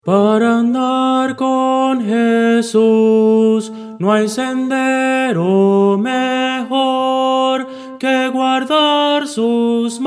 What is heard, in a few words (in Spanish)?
tenor